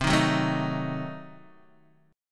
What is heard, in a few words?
C Diminished 7th down Guitar Open D